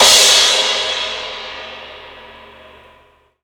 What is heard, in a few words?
Hyper Crash, Cymbal; KJ Sawka; Drum n Bass & Beyond; Drum Tool Box